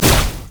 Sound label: manta strike; LoL; fizz